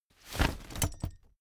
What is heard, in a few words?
umbrella big open full hard